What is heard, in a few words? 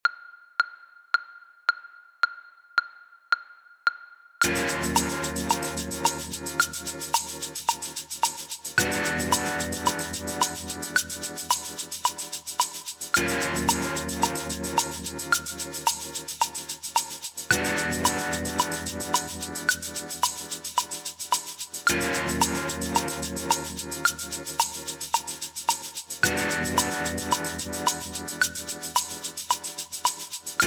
Play Along 110bpm